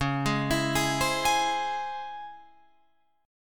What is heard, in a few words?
Db Minor Major 7th Db down Guitar Open F; DbmM7 arpeggiated; JGuitar